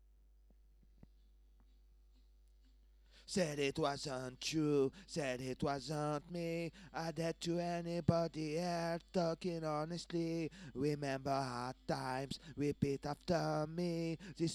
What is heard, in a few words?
vocals part 1 full not overdriven; REMIX KIT